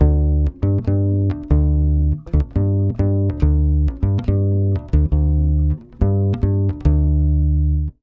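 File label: 140 BASS D minor 7, Brazil Bossa; USB Soundscan; AKAI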